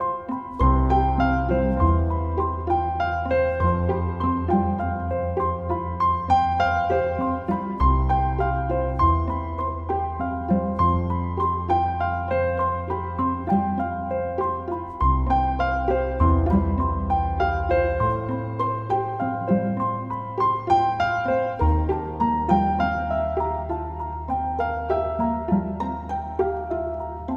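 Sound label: bgm high loop 100bpm C major F major; rainforest; chicory a colorful tale; ps4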